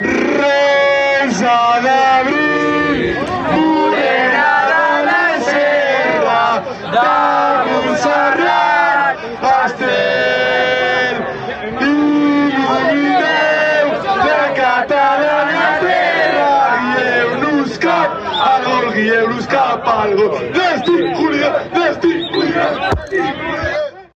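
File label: fanchants, football chants; el virolai nastiquer; spain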